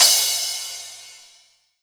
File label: Crash Rock Short; pitchglitch